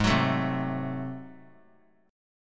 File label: G sharp 7th Flat 9th G sharp down, chord; G#7b9; strummed; JGuitar